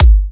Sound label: G, Kicks; markus hakala